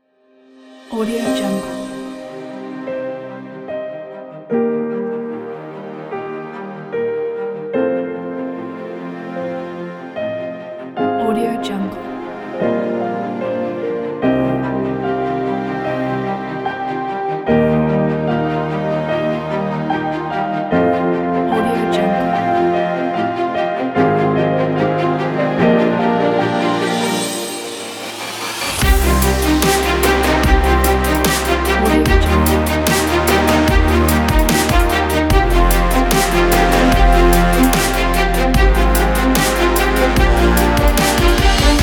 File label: epic inspiring dubstep cinematic